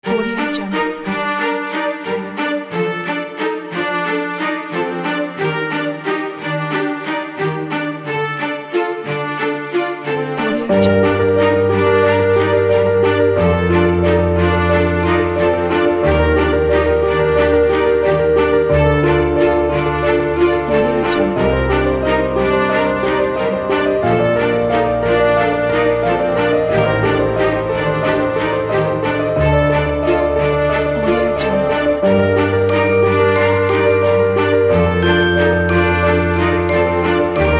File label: french movie drama